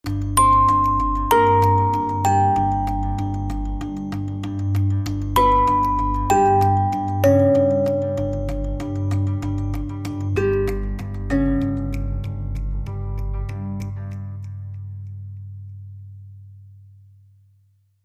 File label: Uncertain Marimba; Sting